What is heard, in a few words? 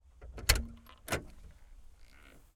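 jeep wrangler onboard door open slow relaxed interior; dpa4061; Sonniss GDC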